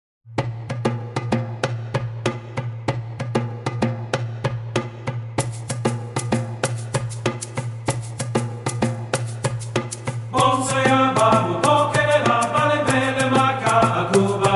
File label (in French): chant